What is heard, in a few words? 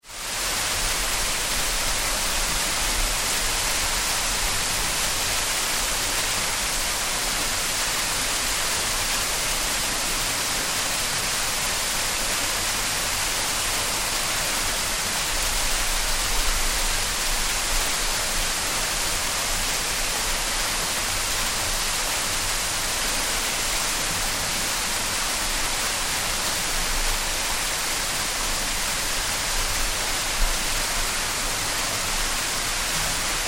Stream Running over Weir - Fast stream close shot dropping over weir; Rivers; Tom Hutchings